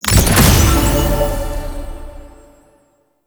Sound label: Select; UI; Focus School Three; Warframe; Tau Mission Menu